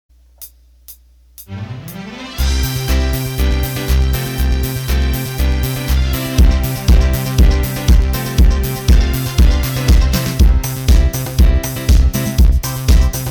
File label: Disco